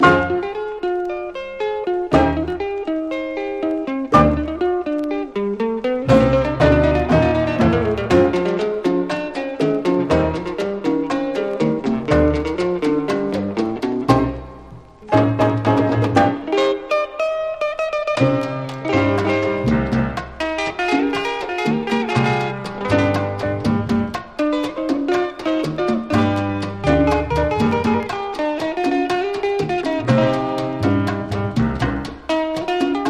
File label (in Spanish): GUITARRA; DANCEFLOOR JAZZ